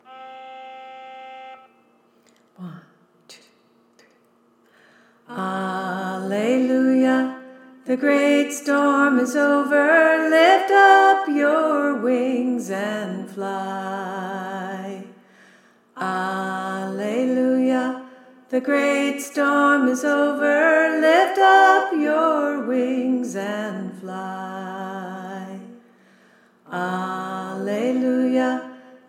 alto melody; choir; The Freedom Choir

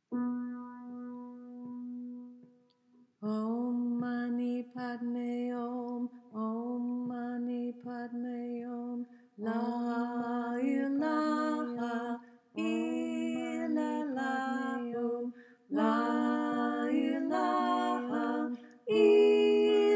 Chant all parts, choir

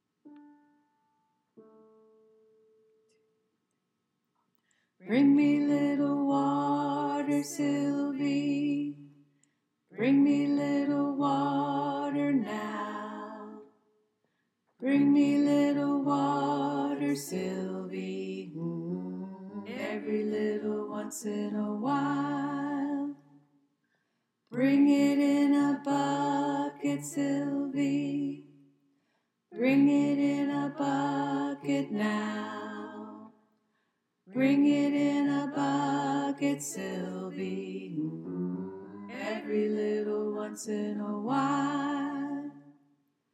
Bass

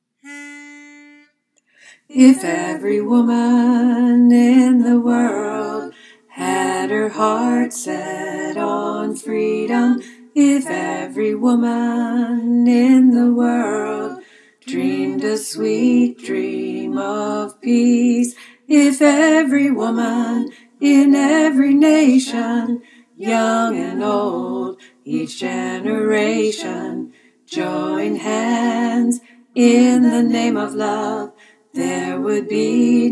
Tenor, choir

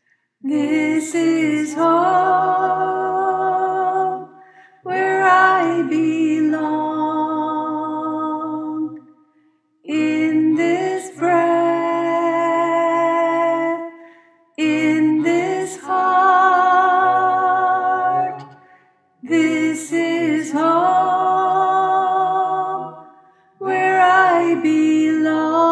soprano, choir

